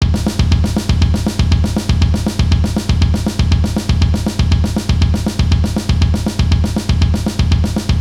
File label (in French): Break